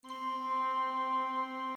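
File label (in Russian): металлический звук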